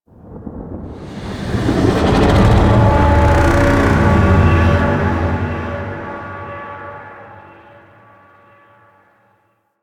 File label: Void Dragon Vocal Distant Idle; Enemies; Vocals; Warframe; Duviri